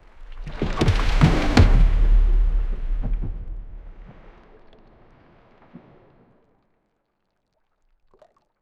sea waves crashing seagul; ships

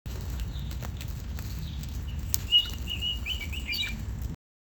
birds chirping rustling

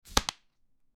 poker card fold dramatic

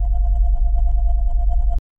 looping sci-fi robot foot

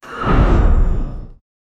sudden horror reveal sting

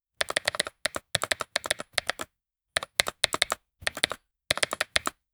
fast phone typing sound; devices

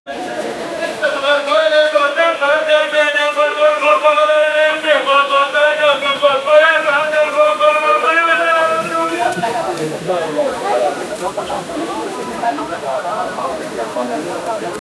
the sound of a small, cultural

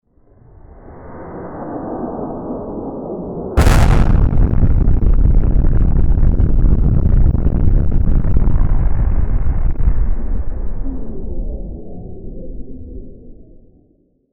explosion new star

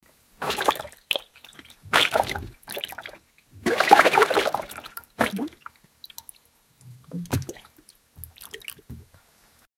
young man vomit water

